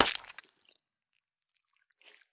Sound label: tomato hit